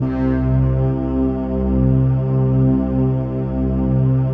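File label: PAD, atmospheric pads; Best Service; XX Large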